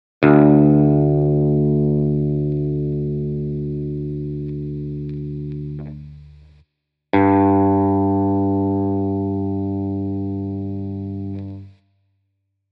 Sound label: open G